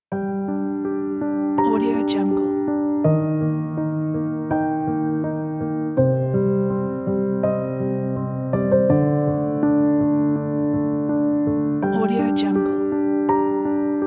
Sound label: sad inspiring piano; korolkov